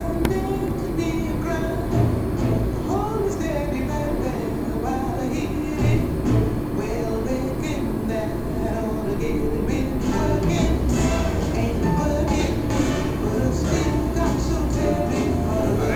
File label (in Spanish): musica de fondo carpinteria